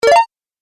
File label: Message Alert, beep